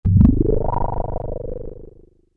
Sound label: Random Sound; Europa